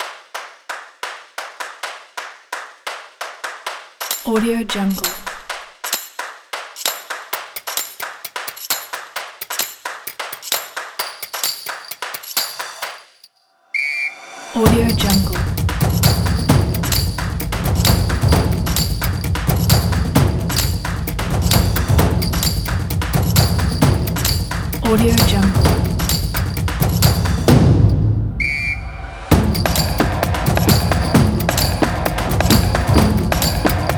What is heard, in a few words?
game of soccer